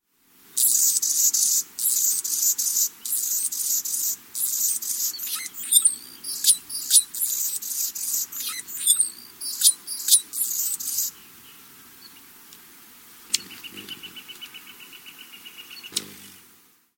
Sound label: annas hummingbird